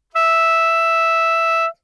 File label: F sharp 5, soprano saxophone